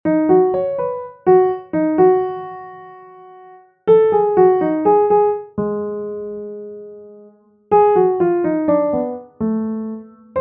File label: bossa nova